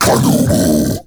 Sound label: Cast Vocal; Warframe; Uriel; Demonium